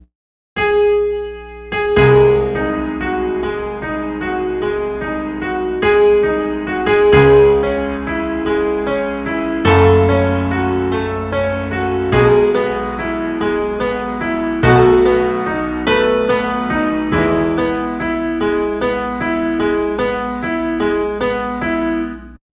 moonlight sonata; left4dead

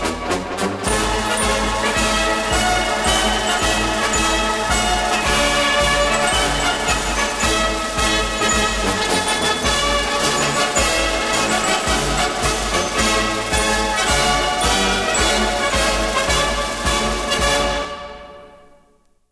axis win; dod

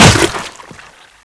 chainsaw hit, weapons

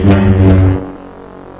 sword stab, weapons; sfsword

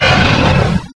nemesis pain, zombie; zombie plague

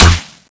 bow shoot, weapons

